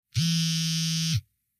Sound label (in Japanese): keitai; 携帯スマホのバイブ音3